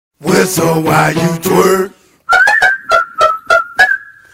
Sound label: twerk